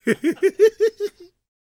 PREACHIN, laughs, jazz

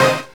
ORC CHD

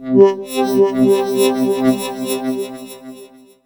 SYNWOB

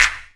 CLAP; E-Lab; X Static Goldmine 2; akai

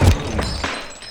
FX CRSH; MIX FX; E-Lab; X Static Goldmine 2; akai

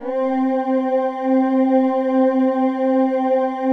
PAD; Best Service; XX Large; Pads

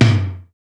TOM; E-Lab; X Static Goldmine 1

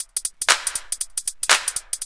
LOOP; E-Lab; X Static Goldmine 2; akai

